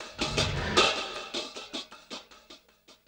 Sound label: Elektro Cym, drums; Sonic Foundry (Sony Creative Software); Friendly Fire